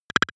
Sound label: click stutter, signale; soundpool